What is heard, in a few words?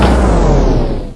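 wind machine end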